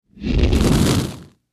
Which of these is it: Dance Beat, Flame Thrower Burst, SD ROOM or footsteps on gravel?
Flame Thrower Burst